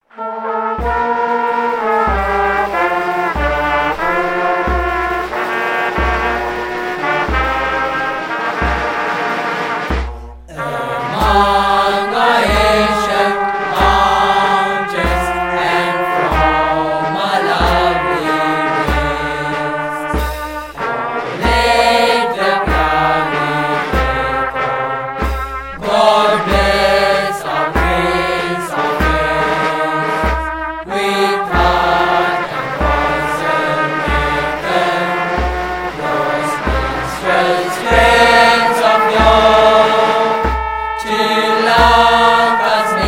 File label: English Anthem